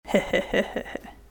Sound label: evil heh heh